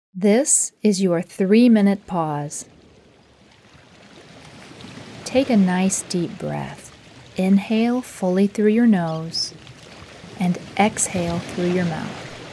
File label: pause ocean waves